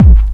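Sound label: 909 kick; E-MU Producer Series Vol. 8 – Platinum Phatt; Best of Phatt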